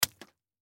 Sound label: FOLEY TOMATO HIT CEMENT